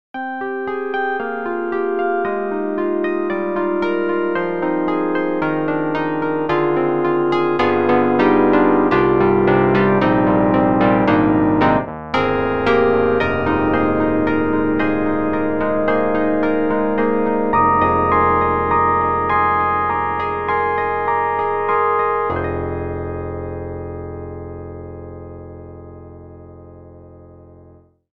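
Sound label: Wurlitzer; EDIROL; Super Quartet; Whirly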